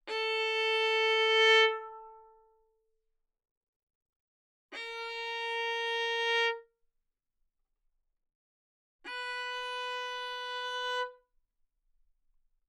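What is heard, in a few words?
Violin arco fortissimo sul A A4 B4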